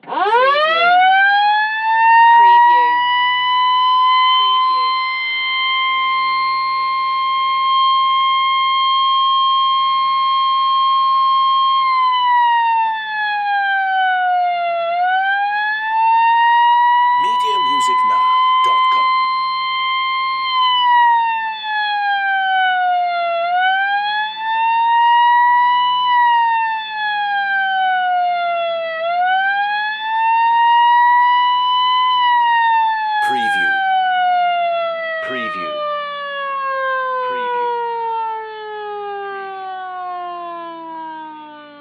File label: Ambulance Long Wail